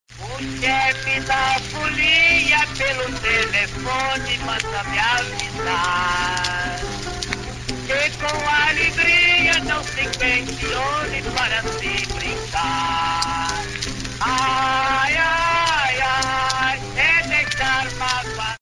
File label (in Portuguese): Musica; primeira gravação que teve SAMBA escrito no rótulo do disco gravada no Brasil - 1917